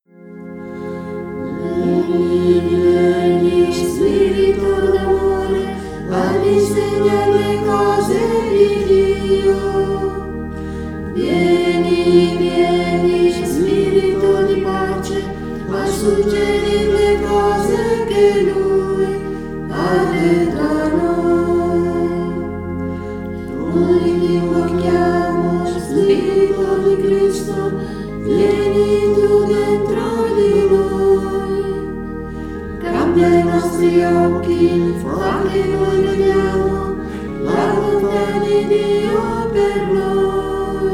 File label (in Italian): Canto